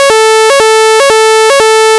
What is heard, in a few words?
554Hz 100ms - 440Hz 400ms, Sounder; Radio Voice Sounder with Beacon; NFS 32-001; SmartVOX